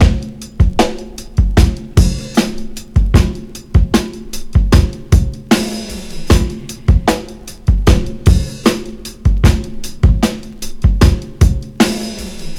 76 bpm 2000s drum loop f key, kick tuned to the F note